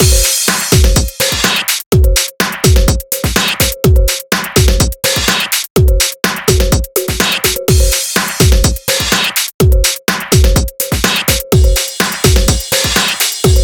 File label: Breakzone, сэмпл ударных брейкбит